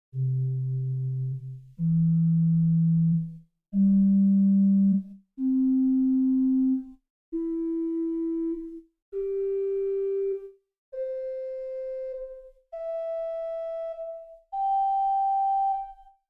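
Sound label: Pedal Nachthorn 4 arpeggio, organ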